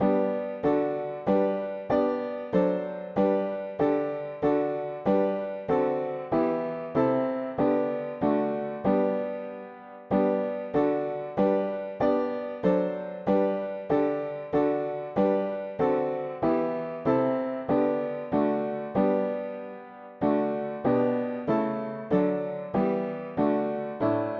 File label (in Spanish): mono piano; hymns; Himnario pequeno